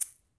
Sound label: Shaker Egg; Drums